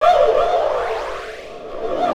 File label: Fx Vox; Equipped Music; Loungin House